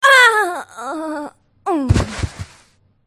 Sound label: bot human female death